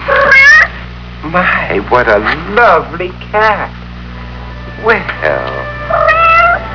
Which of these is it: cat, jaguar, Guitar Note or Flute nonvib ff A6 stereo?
cat